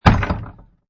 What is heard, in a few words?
Wood Gate